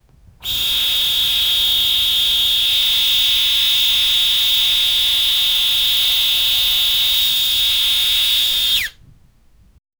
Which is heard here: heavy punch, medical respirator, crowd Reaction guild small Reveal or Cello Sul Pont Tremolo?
medical respirator